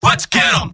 scout battle cry; vo; tf2; mvm

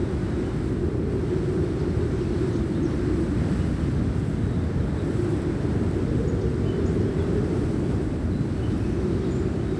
alcove, ambient; Garry's Mod; tf2; rottenburg

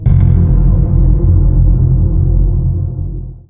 mysterious perc, halloween ambient; Garry's Mod; tf2

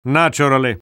spy cheers; vo

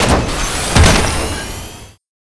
bumper car spawn; taunt; Garry's Mod; tf2

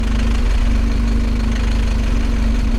spacebase drill, ambient; Garry's Mod; tf2

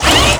walker small mvnt leg; Garry's Mod; omnidroids pack 1

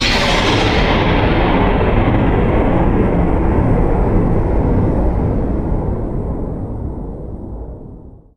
thunder, halloween ambient; Garry's Mod; tf2

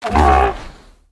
jump, procoptodon; zoochosis pack1

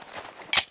pistol reload; weapons; garrysmod; tf2

